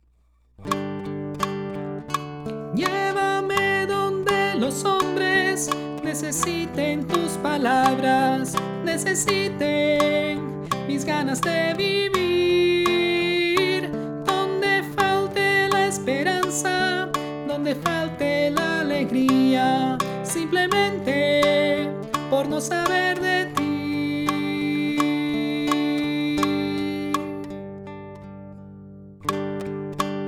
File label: Contralto